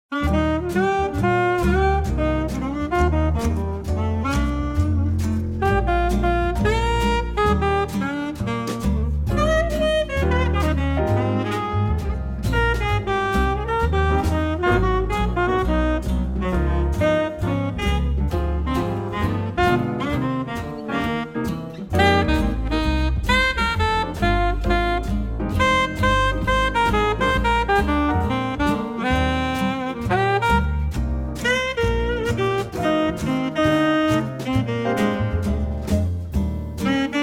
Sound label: Saxophone and Piano Jazz